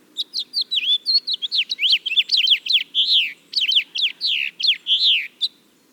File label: house finch